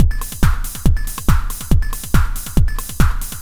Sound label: electro beats 140